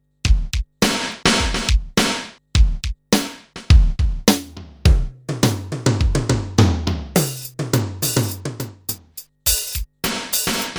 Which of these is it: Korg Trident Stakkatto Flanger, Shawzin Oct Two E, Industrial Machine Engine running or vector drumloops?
vector drumloops